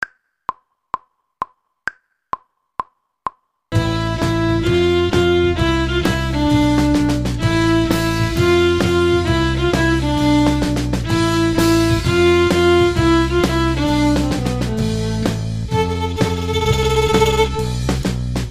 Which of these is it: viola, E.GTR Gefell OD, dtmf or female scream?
viola